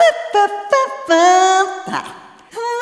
Rhythmic Atonal

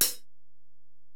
HiHat, Alesis DM-PRO

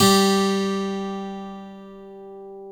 G2 12-ST AC, AC.12-STRING; InVision Interactive; Lightware VOL-1; Instruments & Percussions

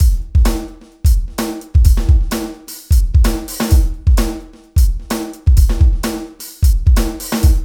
BEAT 129

